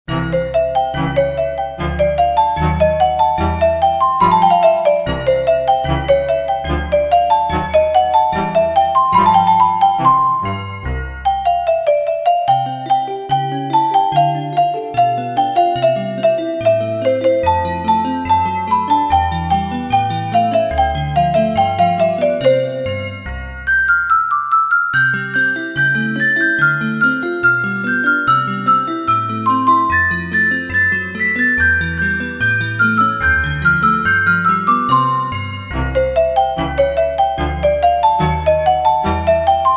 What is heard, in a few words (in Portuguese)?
musica para bebes; atirei o pau no gato